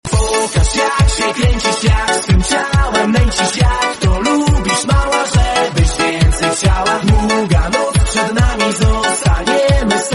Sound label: polish disco